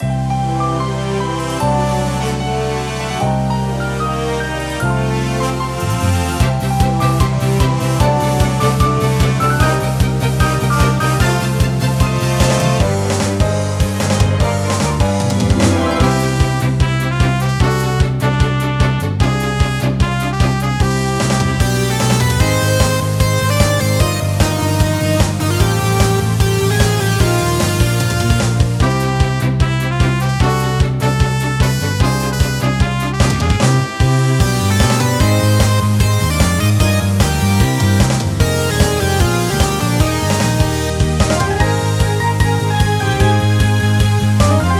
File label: BGM Dungeon Normal Wave; Puzzle & Dragons Gold